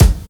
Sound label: raw kick drum one shot g key